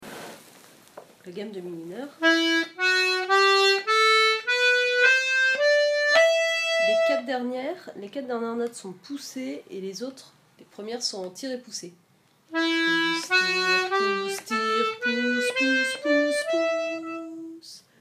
Gamme Mi Mineur

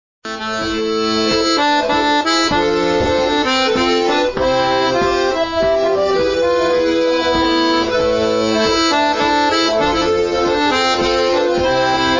Mazurka 2eme Voix; Adieu Rosalie

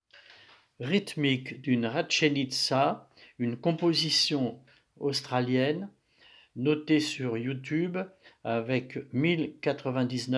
Rachenitza Rythmique; Compo Australienne

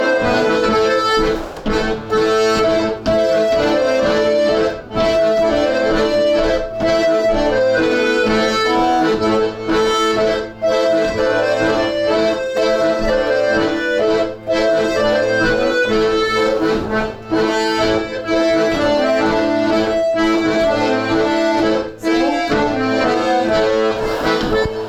rythmique 2eme Voie

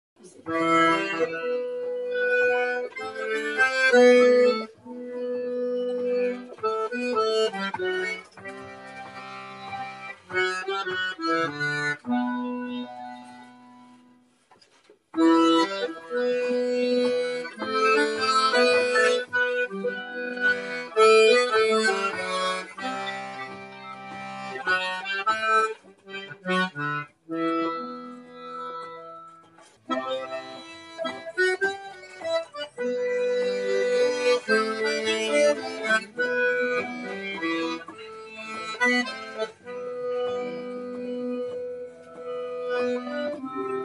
Mazurka; Mazurka Manana

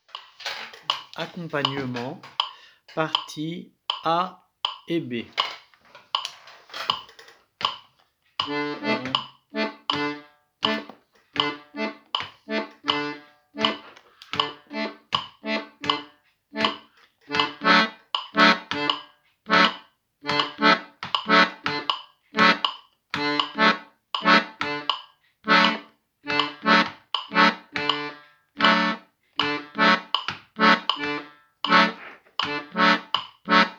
Tawes Rythmique Accomp

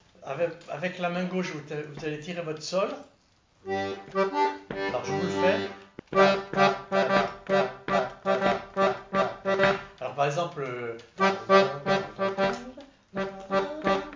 rythmique

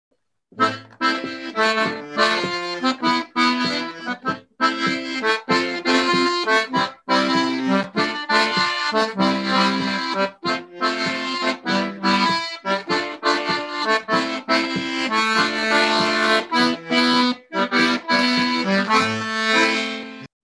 Rythmique Jazz

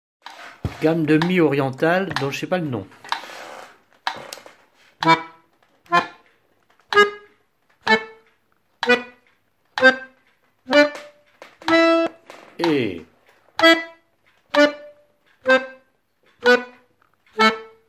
Gamme de mi orientale; Hora-Lautaresca

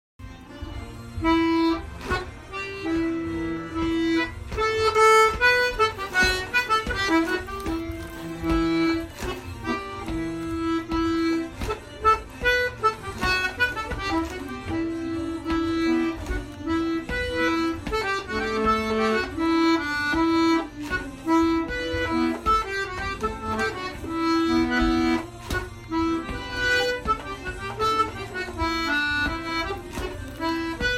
Hanter Dro Belge

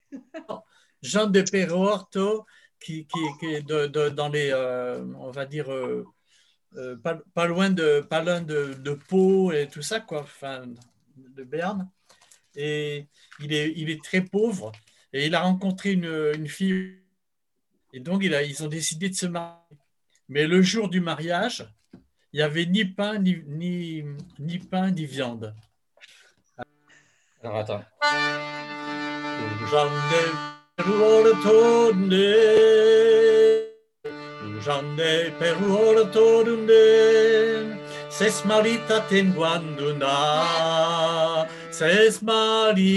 Chant Bearnais; Nin Pan Nin Carn

